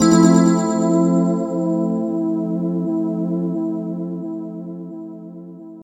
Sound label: EP E, Drum & Bass Pads; Total Drum&Bass; 90 s Sample CDs